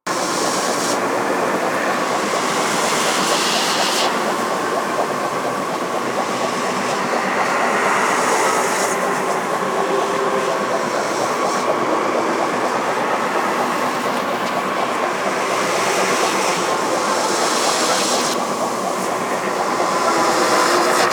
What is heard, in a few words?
Orb Loop; Warframe; Zariman; Survival